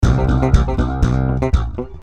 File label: C 120 Steppin Funky